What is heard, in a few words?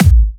Hard Kicks; Vengeance Samples